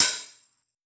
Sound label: Open Hat, 909; Slate; Vintage Drum Machines Volume 3 909 Edition